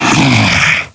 Scrake Pain

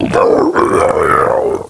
Deer Roam

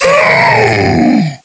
Clot Death

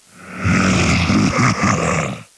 Scrake Acquire

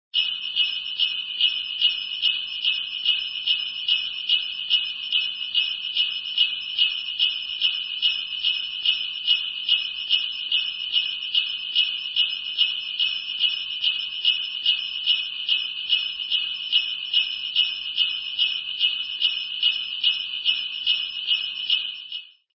sleigh bells; sleigh bells 2; Xmas

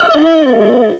Stalker Acquire

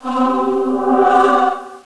Vampire Sound

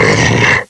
Attack; KF Christmas; Jack Frost

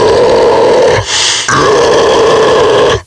Pound Roar

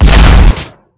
Vehicle Collision

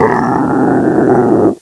Deer Acquire

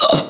Siren Hit sound; Carol

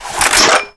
PNut Pickup

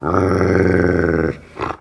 dog growl; animals

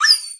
dog hit; animals

